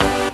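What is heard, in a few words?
synth tone; Best Service Gigapack 1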